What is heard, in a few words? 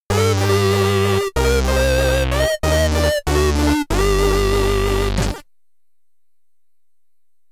Game Over, SID Stereo; Tales of Boon; Commodore 64